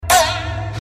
boing; nakime

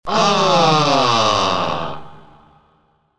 aaaaa mm

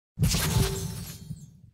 emoticon sound effect; fortnite

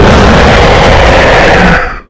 jumpscare; fnaf plushies 3 v4

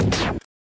confirm; street fighter iii 3rd

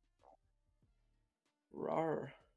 rawr; sigma boss

